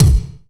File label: BD PROC, DRUMS; RY30DRUMS; East West; Global Sounds